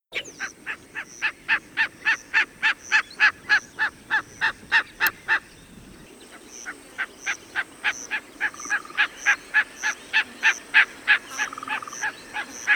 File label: Terathopius ecaudatus -- Bateleur